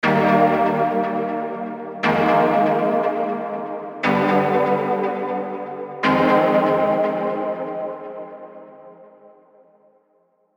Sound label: Major Sixth Diminished Prog; Unison Major Sixth Advanced Scales MIDI Collection